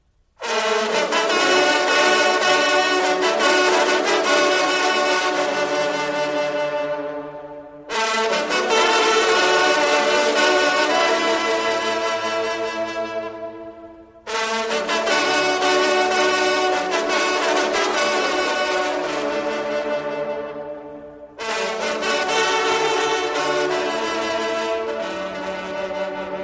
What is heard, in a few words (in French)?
sonnerie cor de chasse ringtone